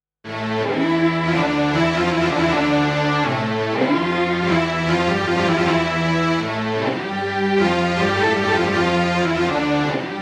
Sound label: Orch Porta; Pa2x